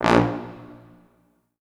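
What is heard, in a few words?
Orchestral Brass, A sharp 0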